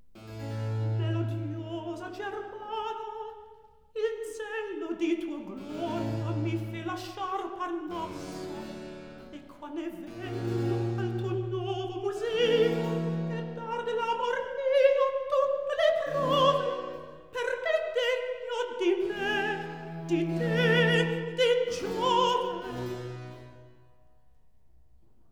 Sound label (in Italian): Recitativo; Melodiosa germana; Terpsicore HWV 8b